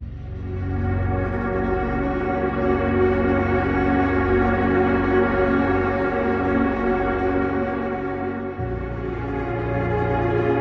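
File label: Meditate, Meditation background music with soft ambient pads and peaceful sound textures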